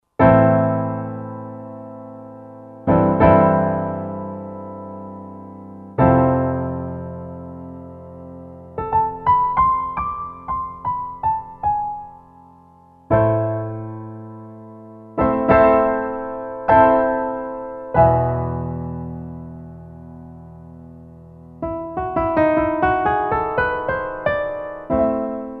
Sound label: Grandioso, Roland SR-JV80-03 Piano